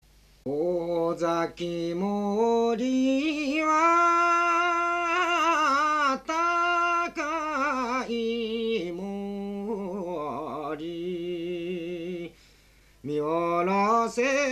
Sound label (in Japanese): kometukiuta